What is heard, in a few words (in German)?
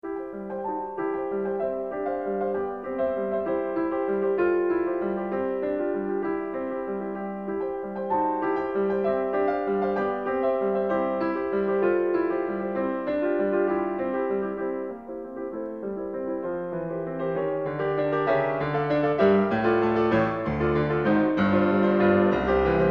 Kinderszenen für Klavier; Wilhelm Kempff; Op 15